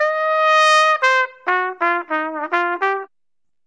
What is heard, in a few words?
acid jazz trumpet